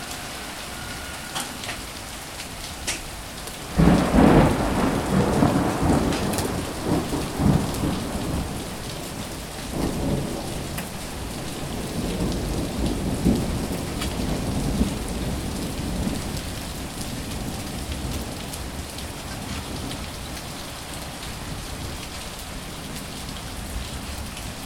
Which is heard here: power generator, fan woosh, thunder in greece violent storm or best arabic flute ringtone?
thunder in greece violent storm